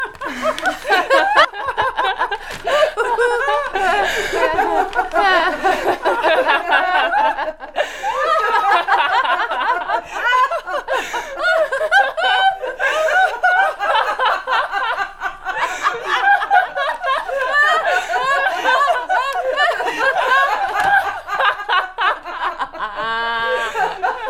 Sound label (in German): Lachen